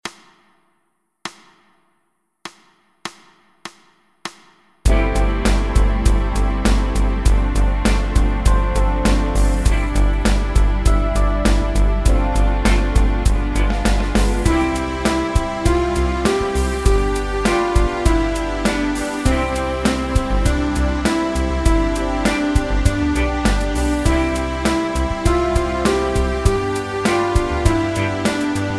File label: Backingtrack; Ode An Die Freude